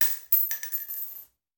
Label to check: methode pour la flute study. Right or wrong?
Wrong